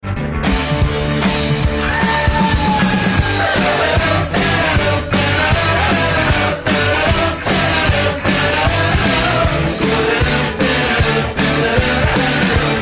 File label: goal song ringtone; chicago blackhawks